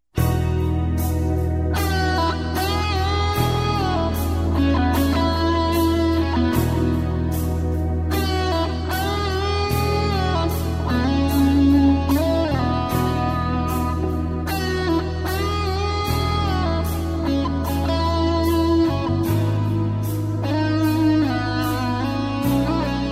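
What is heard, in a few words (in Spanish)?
sintetizador guitarra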